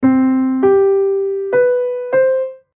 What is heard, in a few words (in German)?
C dur melody